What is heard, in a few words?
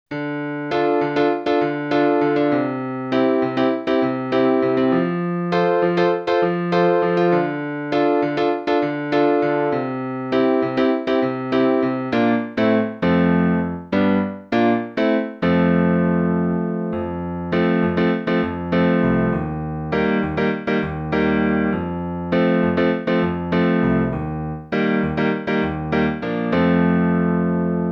first song piano